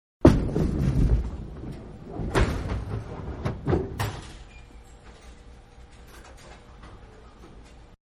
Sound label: Bowling-Gutter Ball-Medium Distance; Bowling (Sound Effects:Sports); Manhattan Production Music